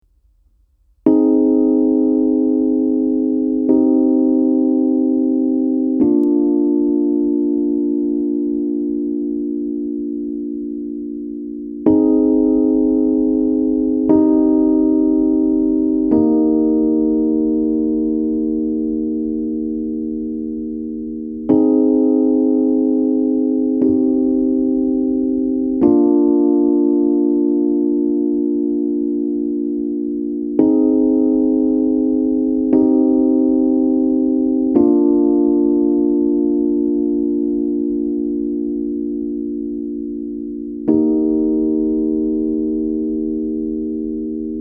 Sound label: song idea 2 chords; A quick little exploration of a chord progression.